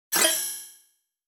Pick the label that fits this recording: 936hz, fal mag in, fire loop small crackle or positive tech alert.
positive tech alert